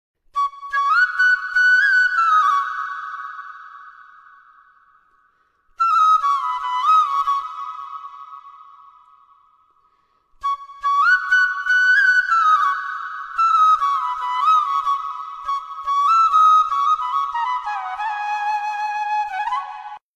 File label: krishna flute ringtone krishna flute ringtone